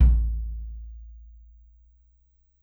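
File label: 18in Kick, left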